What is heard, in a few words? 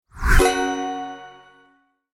swoosh